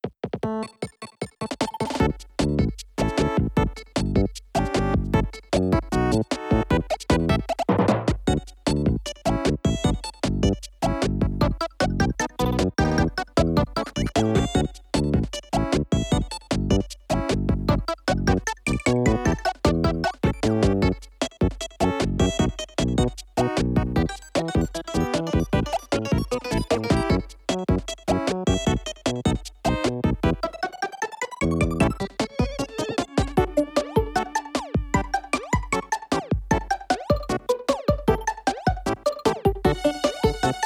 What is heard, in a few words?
bgm; OnionOS Themes; Super Onion Entertainment System